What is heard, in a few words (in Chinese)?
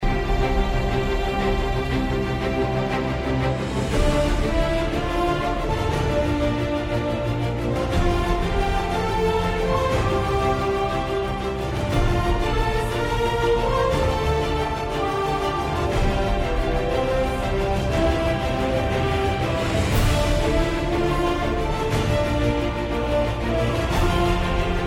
大气的背景音乐